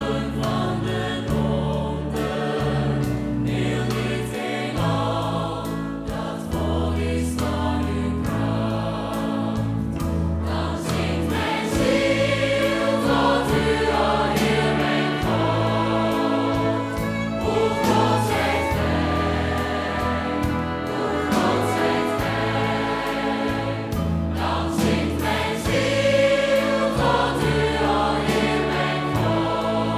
Koor gemengd

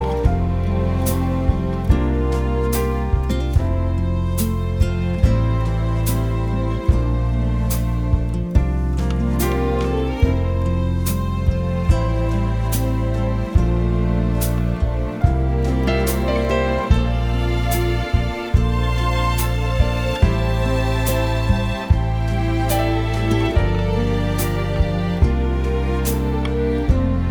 instrumentaal

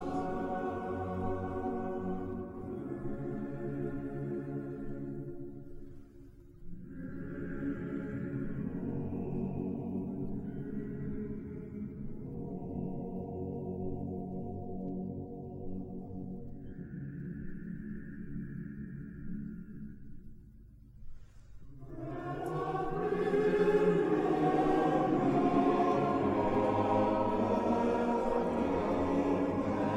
Koor (mannen); Amersfoorts

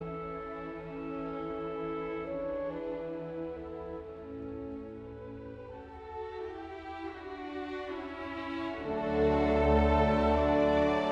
Klarinet; Mozart

